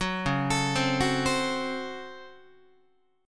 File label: Db Major 7th Suspended 4th Sharp 5th Db Guitar; DbM7sus4#5 arpeggiated; JGuitar